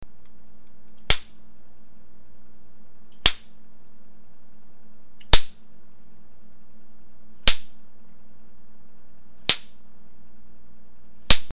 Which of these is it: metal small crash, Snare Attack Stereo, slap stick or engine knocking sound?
slap stick